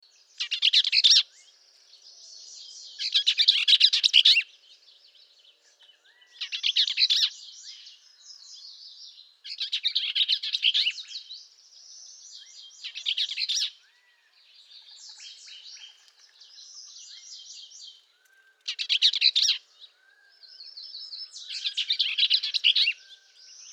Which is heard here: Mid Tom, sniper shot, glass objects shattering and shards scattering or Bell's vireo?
Bell's vireo